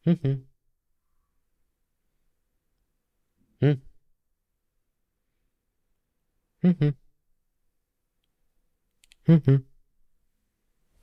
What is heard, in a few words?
Voice Man Mmh Calling Closed Mouth